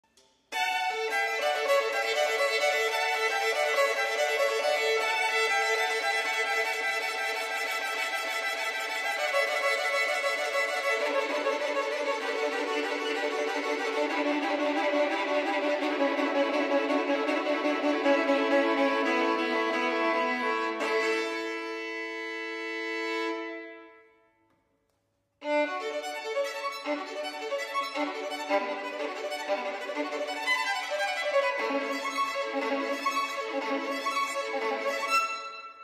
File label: Violin Concerto Cadenza; Pietro Locatelli; Violin Concerto N. 12